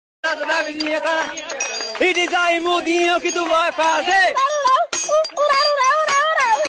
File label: hahaha